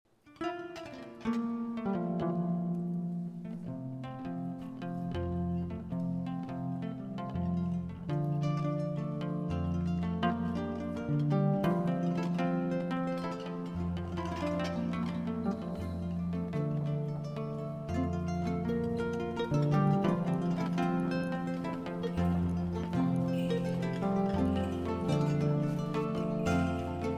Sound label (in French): Chant de griot